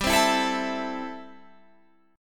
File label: A-Minor 7th G